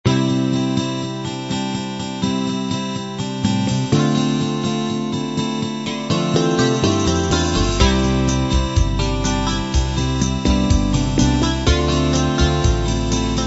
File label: light rock intro